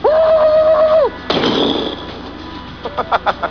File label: BOOM; (Woo's, boom and a laugh); Grosse Point Blank